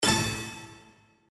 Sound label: Reel Spin Stop; Outdoor America